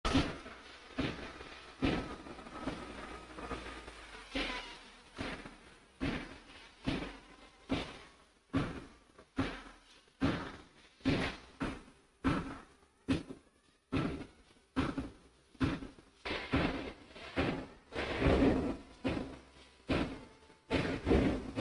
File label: factory rnnoised